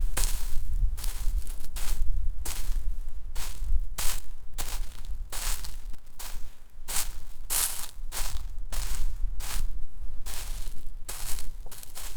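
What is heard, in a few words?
walking on grass, footsteps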